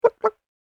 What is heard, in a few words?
Chicken Animal Impersonation